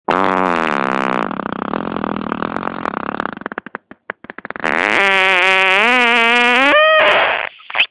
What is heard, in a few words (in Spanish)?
Potente, pedo